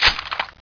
m4a1 bolt release, weapons

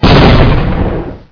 explode, weapons

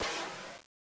sg explode, weapons

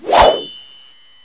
dagger stab; weapons

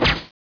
biting hook

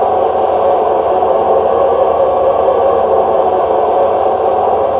pre cast holy magic low; warcraft3

